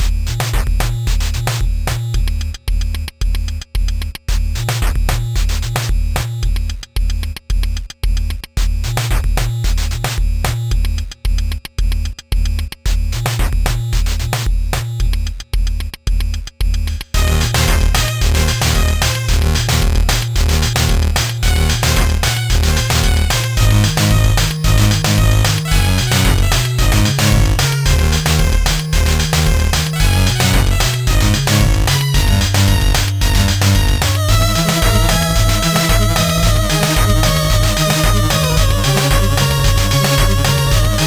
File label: Haunted Forest Loop